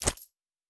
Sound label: Coin Bag; Survival Game Sounds - Lite Edition; Objects and Items